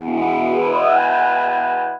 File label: whoa, wah